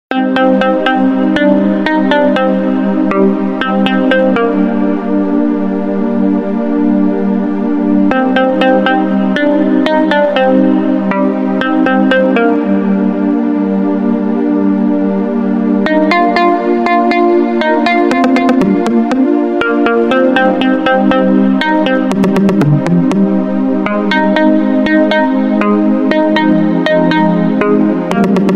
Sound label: Ringtone